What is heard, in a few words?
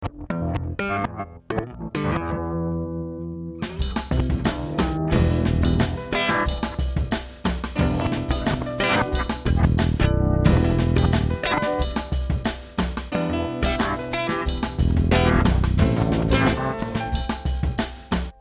INSTRUMENTAL